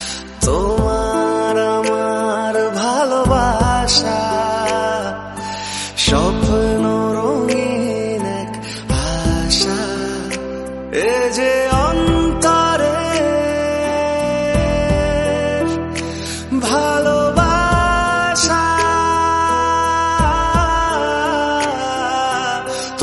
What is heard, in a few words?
bengali romantic